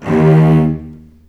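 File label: D#1 arco long cello, left; East West; The Ultimate String Collection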